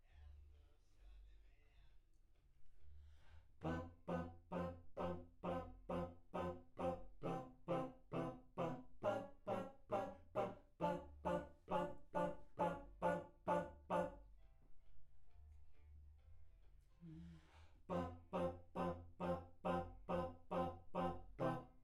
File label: Backs AKG LEFT